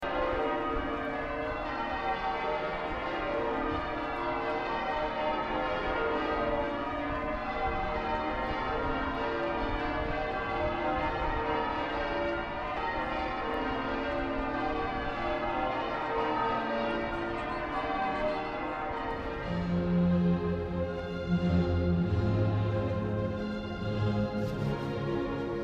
york minster bells, york musical society chorus orchestra practicing